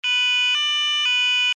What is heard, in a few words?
alarm tone, sounder alarm tone; sounder beacon